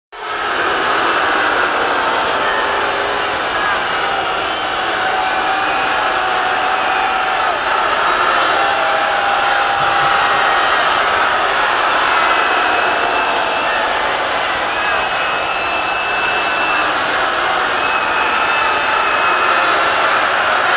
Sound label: Football Crowd Stea, sports arena football